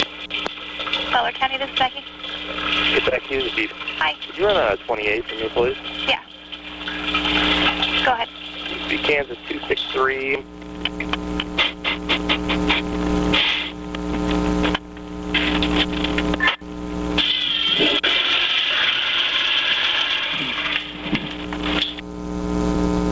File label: open mic in the bathroom